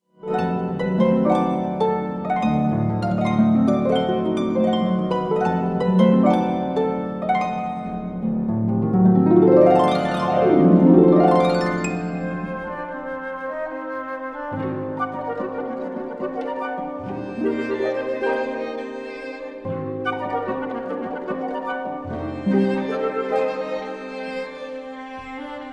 introduction and allegro for harp flute clarinet and string quartet; Maurice Ravel